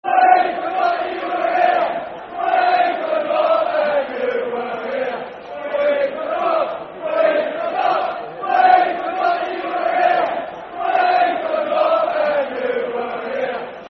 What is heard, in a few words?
We Forgot That You Were Here, football chant, soccer songs; Fulham Ffc